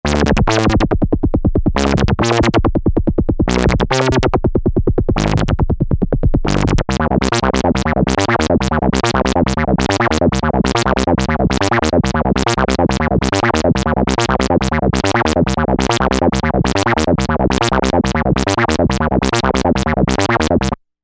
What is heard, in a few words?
Rubber Duck, synth